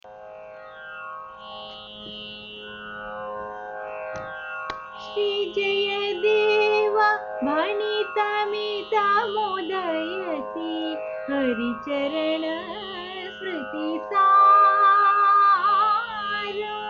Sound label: raga; lalita